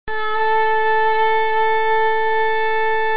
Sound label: violin